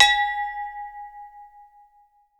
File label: ENGL CONI, AGOGO BELLS; Voice Crystal - Steve Reid Definitive Percussion